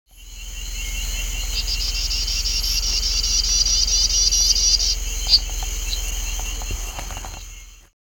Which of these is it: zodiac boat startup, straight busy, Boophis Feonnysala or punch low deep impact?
Boophis Feonnysala